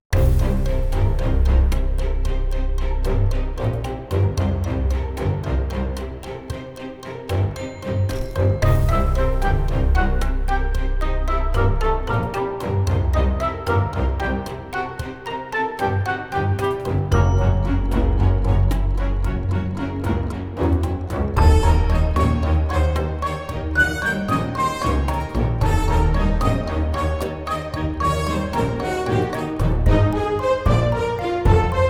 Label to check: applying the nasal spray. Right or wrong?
Wrong